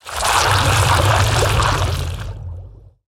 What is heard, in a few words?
Blood Magic Underlay Play